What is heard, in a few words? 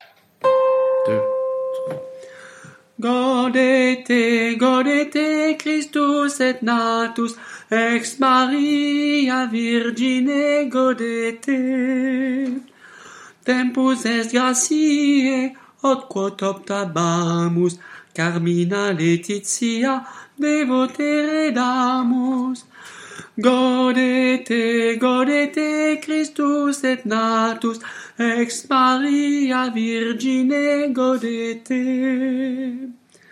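soprano, chorale; Gaudete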